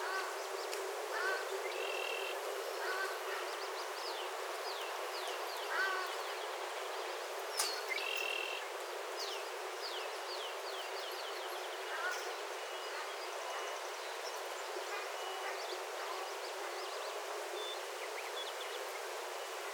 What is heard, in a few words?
exterior; ambient; inferno